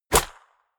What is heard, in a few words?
golf ball hit